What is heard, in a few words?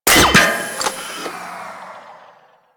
Mech; Grn Ogris